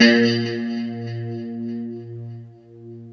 LO E A#2, Guitars; Spectrasonics; Hans Zimmer Guitars VOL-1; JC-SHEEN

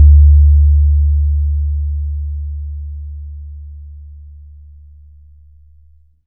BAYAN, Drums Percussion

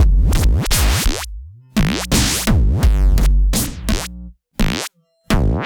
Structured Rhythm, IDM Rhythms

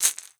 MARACAS; Xsample VOL-9

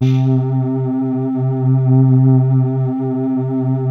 PERU LEAD C2; FINE ART 3

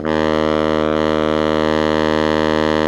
BARI MF D#1, Sax; Giga Samples Collection; BARI QUICK

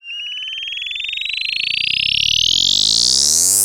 Synth FX

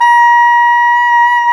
SAX SOP SAX, Soprano Long; Roland LCDP07 Super Sax

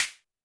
Snare, Roland TD-20

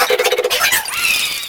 Fan Chant; Mario Paint

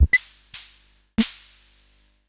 Bontempi HF222

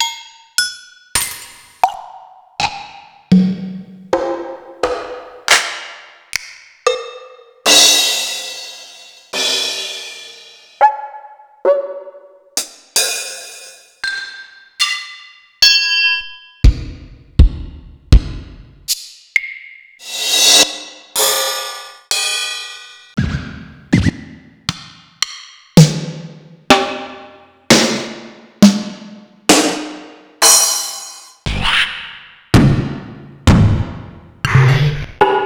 Yamaha SY22

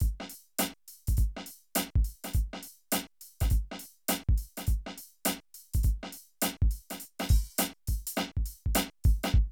Drum loops, Streetsoul

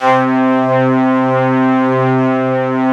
ST-ORG, STRINGORGAN, Yamaha VP-1

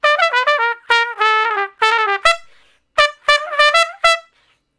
Trumpet Straight (E); Crimson Blue and Fabulous Horncraft 4 RnB; Horncraft for R&B; Solos

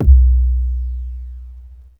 POOF; NorthStar - The Wizard VOL-2

